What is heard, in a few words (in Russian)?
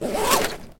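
резкий звонкий звук закрывающейся молнии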